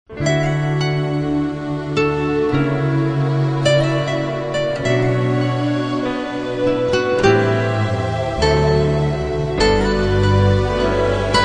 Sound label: Instrumental Hymns